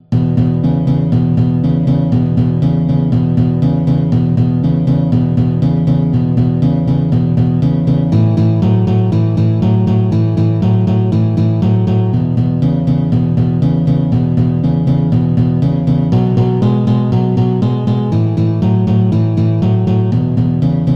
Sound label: Riff example, blues; Blues Riffs